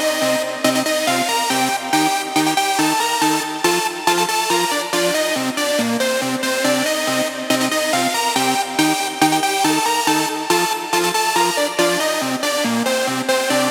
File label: 140BPM C; Vengeance - Essential Clubsounds Vol.4